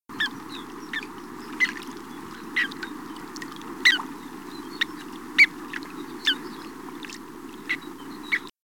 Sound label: Purple Sandpiper